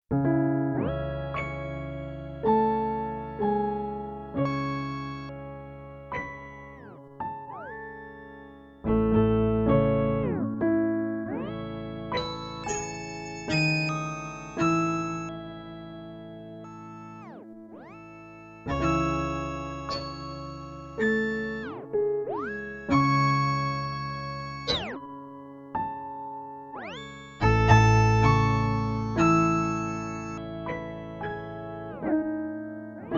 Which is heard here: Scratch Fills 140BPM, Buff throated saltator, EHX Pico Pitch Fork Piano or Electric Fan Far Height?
EHX Pico Pitch Fork Piano